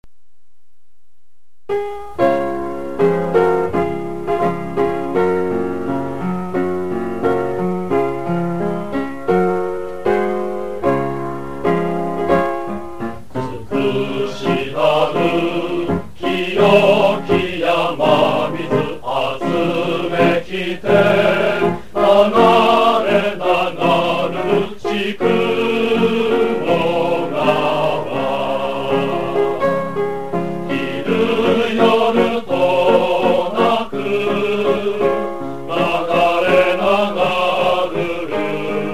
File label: fanfare; krypton